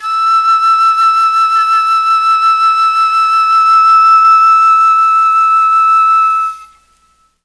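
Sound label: E4 chamberlin flute, Mellotron; Mike Pinder - Mellotron Archive CD; CHMBLN FLUTE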